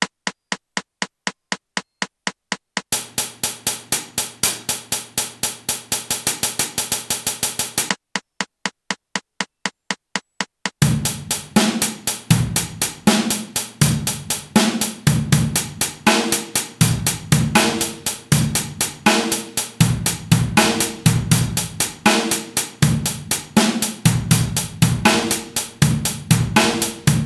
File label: blues